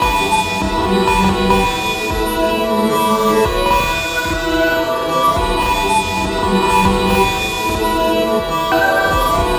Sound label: Sunscoop Melodic, Tonal Atmospheres; Sonic Foundry (Sony Creative Software); Myths of Technology